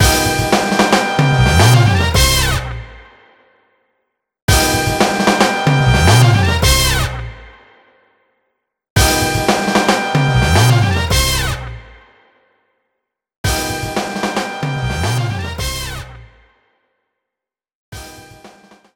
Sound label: Race End Sting